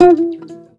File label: SHUR NOTE, TOPSHUR NT